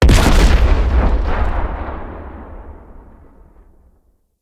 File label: Fire Blade Slam Impact; Characters; Enemy; Abilities; Sinecure; Banneret